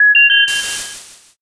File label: modem